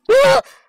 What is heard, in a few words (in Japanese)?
悲鳴; 謎のホームビデオ